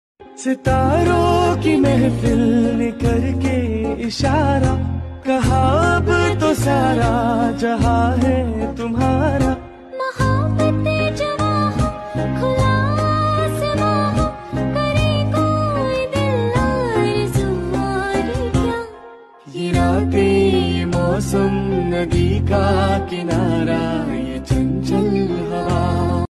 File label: slowed and reverb